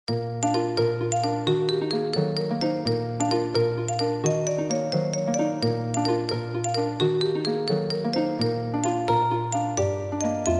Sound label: underwater; Donald's Dock